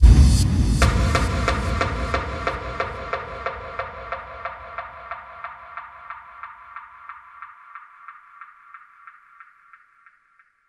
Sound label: bassdrum reverb FX kick; Vengeance Samples